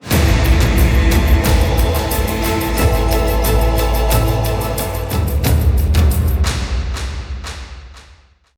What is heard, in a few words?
Mission Fail; Warframe; Deimos